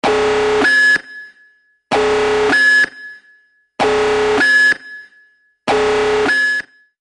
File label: Powerful Alarm